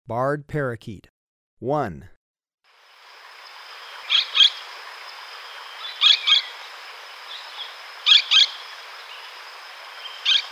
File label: Barred Parakeet, Birds of Ecuador; S. America